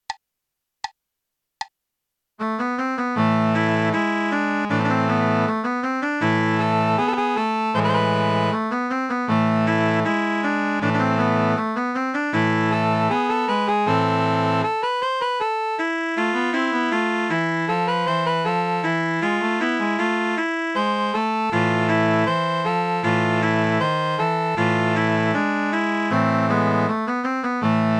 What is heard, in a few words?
Tambourin; Rameau